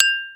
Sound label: Glass Knife; StecMetronome